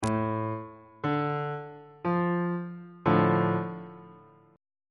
d minor 2nd inversion on bass clef

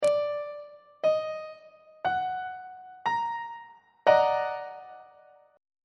e flat minor major 7th 3rd inversion; on treble clef

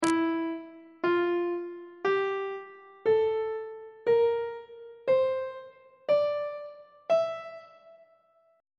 f flat locrian mode on treble clef

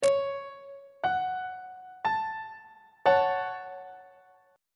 f sharp minor 2nd inversion on treble clef

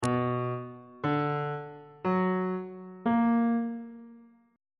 c flat minor major 7th chord intervals

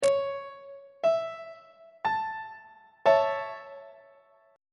g double sharp major 1st inversion on treble clef

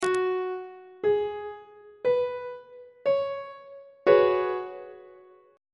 d flat dominant 7th suspended 4th 1st inversion; on treble clef

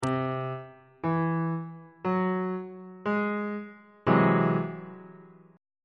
a flat augmented 7th 1st inversion

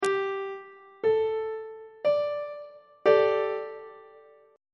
g suspended 2nd chord on treble clef

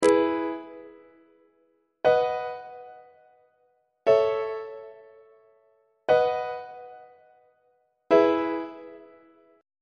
e major perfect authentic cadence on treble clef

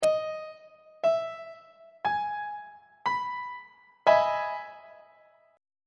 d double sharp major 7th 3rd inversion; treble clef

